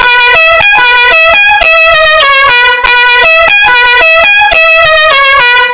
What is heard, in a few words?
fanfara lacucaracha